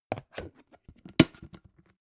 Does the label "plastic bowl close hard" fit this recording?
Yes